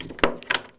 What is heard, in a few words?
Switch